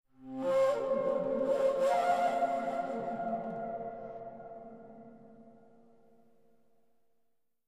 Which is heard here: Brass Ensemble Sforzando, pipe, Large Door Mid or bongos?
pipe